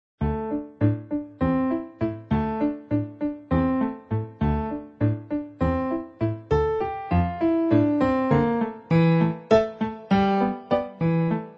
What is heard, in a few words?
Circle Dance